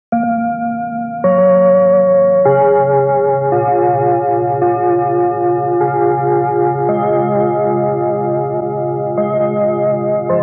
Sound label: chime; denon